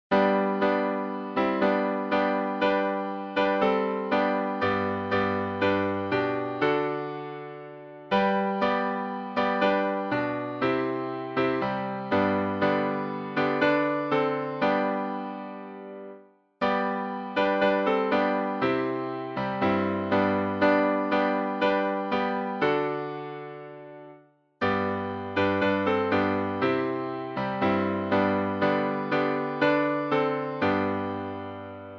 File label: Hymns